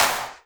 normal hit clap

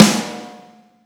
soft hit clap

